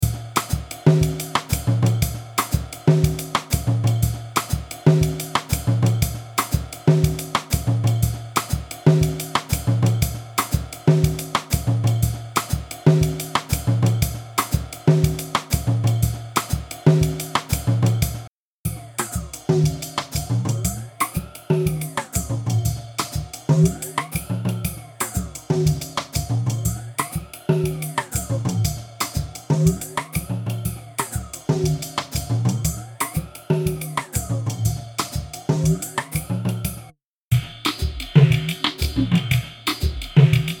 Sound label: Drum